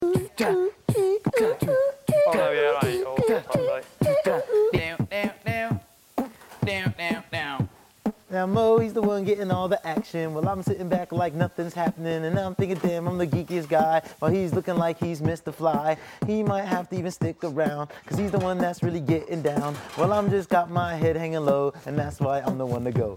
Rap; Big Brother

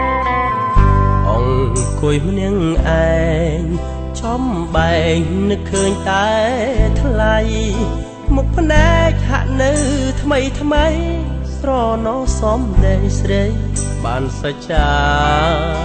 Khmer Song

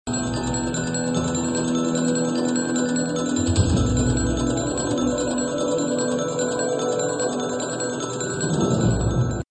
Serious Music

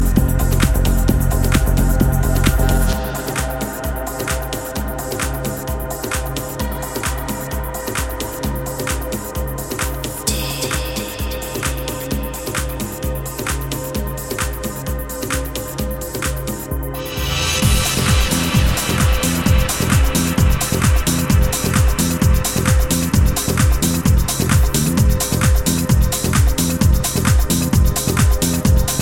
Techno

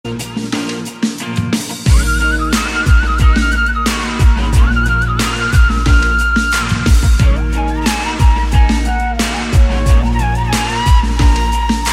bansuri

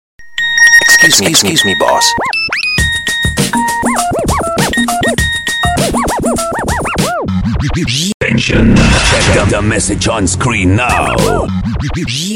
Nokia reload

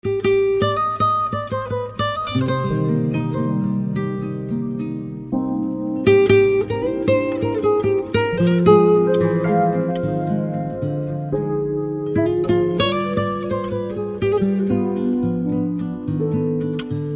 Delightful Guitar

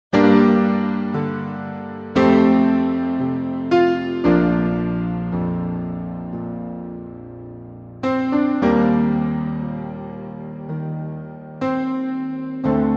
Sad Piano

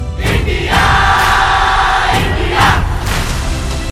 Cheering; India

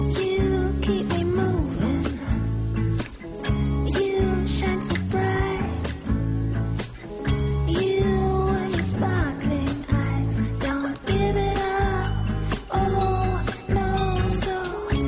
Commercial Song; Zewa

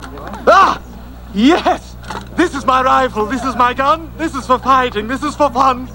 Rifle And Gun

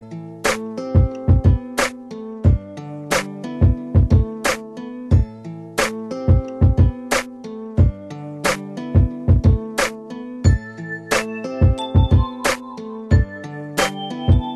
Smooth Hip Hop Mix

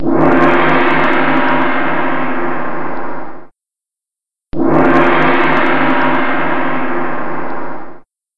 Temple Gong

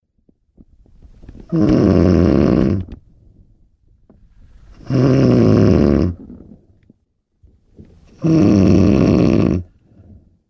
Super Snore

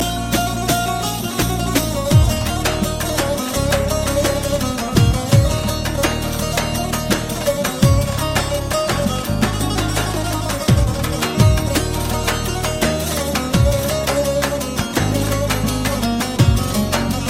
Kurdi

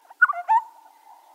Bushveld Bird

